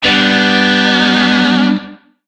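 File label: Shawzin Major Chord; Warframe; Lizzie Shawzin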